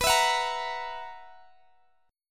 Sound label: B Major 7th Suspended 4th, Guitar Half Step Down chord